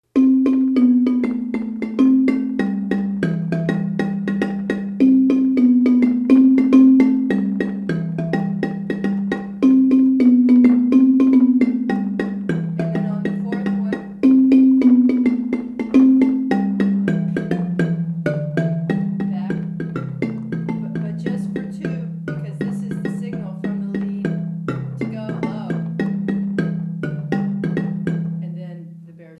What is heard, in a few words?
baritone stay high, marimba; At this point the baritone stays high, too. This happens 4x, then the baritone joins the lead low for 2x as the signal to go into the low section.